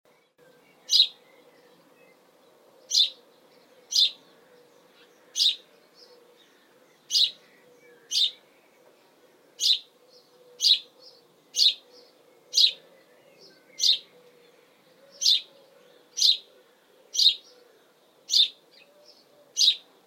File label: Bird House Sparrow UK Suffolk Spring Calls Chirp